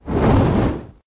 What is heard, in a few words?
fire